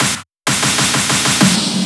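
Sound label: snare Fill, 128BPM; VEC5 Multi Loops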